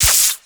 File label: Shock Orb Beam Hit; Eximus